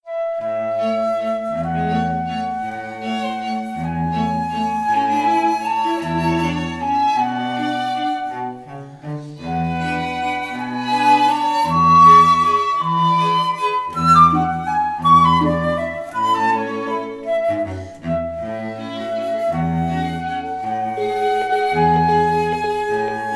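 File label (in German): Klingelton Flöte; Android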